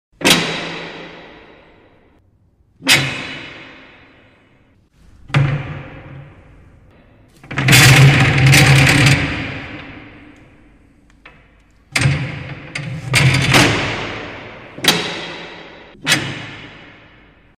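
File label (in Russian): звуки дверей с эхом в тюрьме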